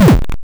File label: slam, tetrimino; Blokos